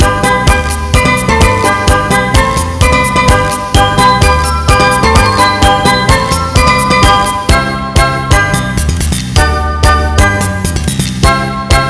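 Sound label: latin loop; avr loop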